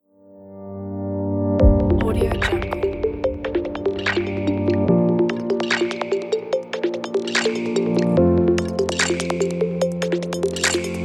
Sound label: ambient technological background; penguinmusic